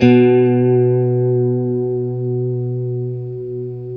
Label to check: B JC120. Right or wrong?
Right